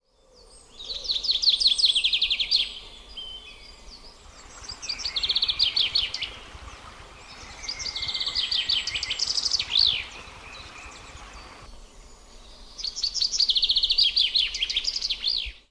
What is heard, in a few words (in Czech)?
hlas zvuky zvirat